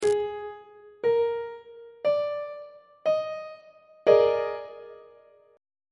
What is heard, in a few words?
d sharp major 7th suspended 4th 1st inversion on treble clef